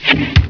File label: Bonk; Chrysanthemum 1.01